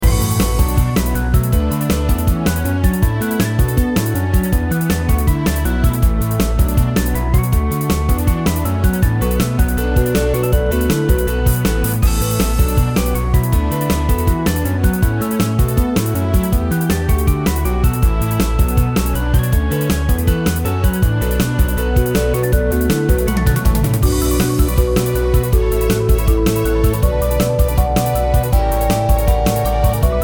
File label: encounter; Manamon Music; Manamon 2